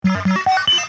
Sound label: Extra Bert; qbert